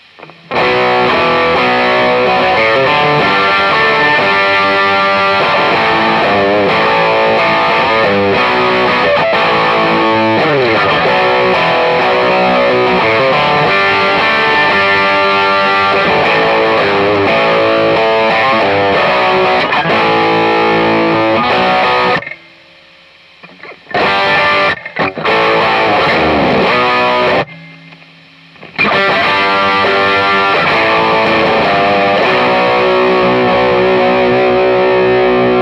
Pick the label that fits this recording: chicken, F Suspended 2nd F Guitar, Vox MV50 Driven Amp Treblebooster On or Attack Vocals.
Vox MV50 Driven Amp Treblebooster On